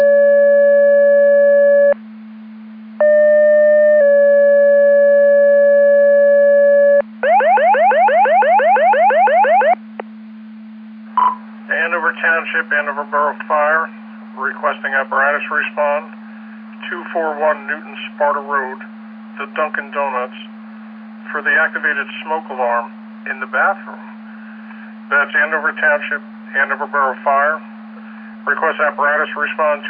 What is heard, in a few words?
Siren; Andover Twp Boro